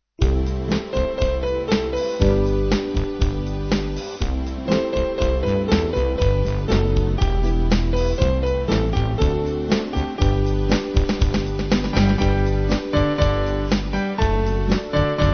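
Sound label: Band, Music Backing Files for Churches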